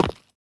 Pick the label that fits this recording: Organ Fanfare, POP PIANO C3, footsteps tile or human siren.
footsteps tile